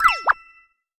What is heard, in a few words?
Character Surprise